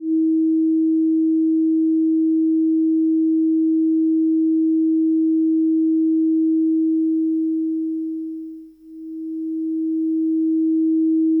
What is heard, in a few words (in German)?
verstimmen sinus; harmonie